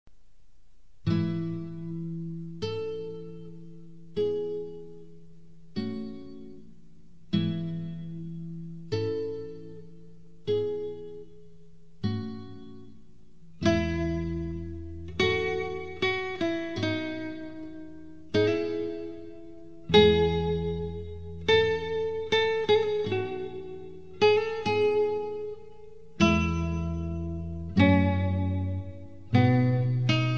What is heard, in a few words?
Guitar